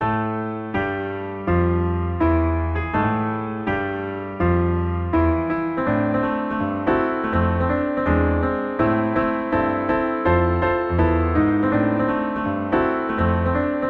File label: Piano